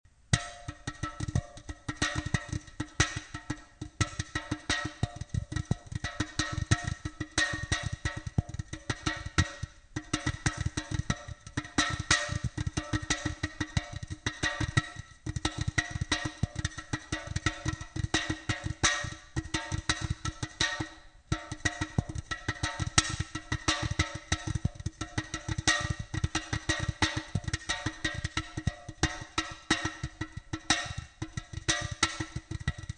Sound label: beer can music